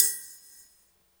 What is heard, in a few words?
Perc, retro house samples, Drum Hits